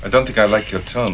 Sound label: tone